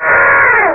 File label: alien die; backbone